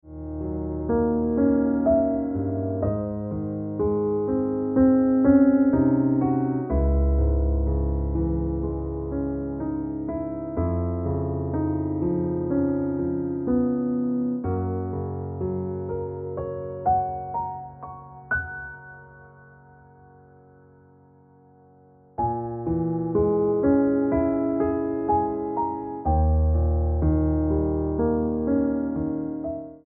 Ringtone Piano Music

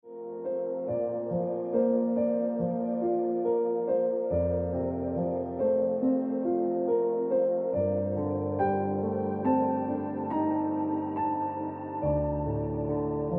Tonic Note Piano Music

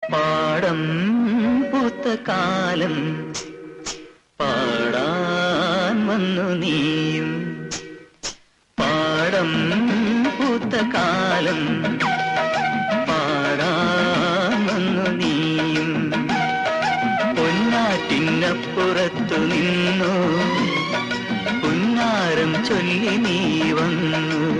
Malayalam Love Song

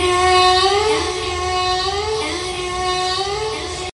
Creepy Chillings

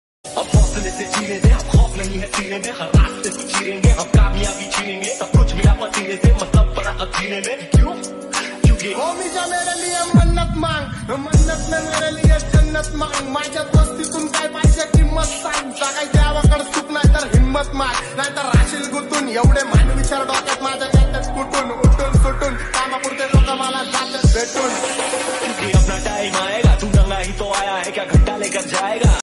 Mashup Remix Hindi

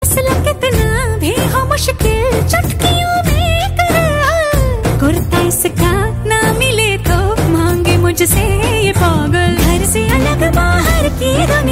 Hindi; Rashmika Mandanna

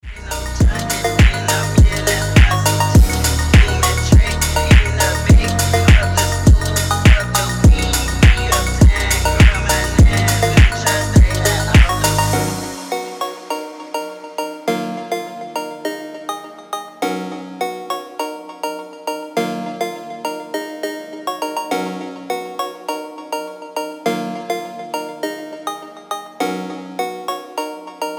Slowed; Ringtone

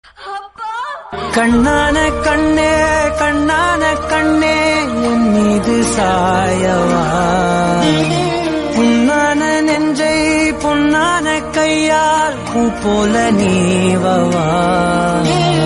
Sentiment Tamil South Indian

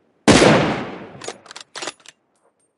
M24 Sound Message; Ringtone; Pubg

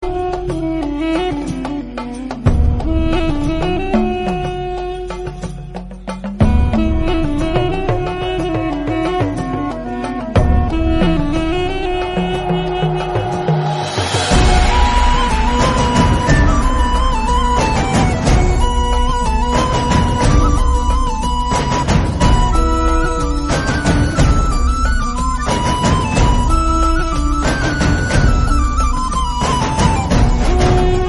Bgm Theme Remix Instrumental Tamil; Karthi; Aayirathil Oruvan

